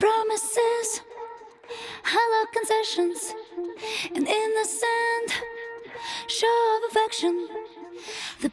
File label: vocal loop, acapellas